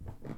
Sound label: Stairs footstep; Phasmophobia